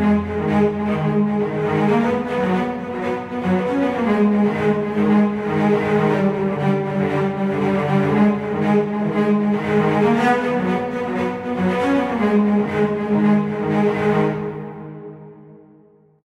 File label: cello, 118 bpm